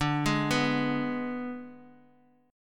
G sharp Diminished D

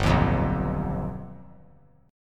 G Augmented 7th; G+7 strummed; JGuitar

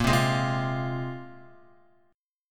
Bb 7th Suspended 2nd, Guitar, Bb7sus2 chord; x,1,0,1,0,1; 4F,4A,5C,5G,5C,6E; Bb7sus2 strummed; JGuitar

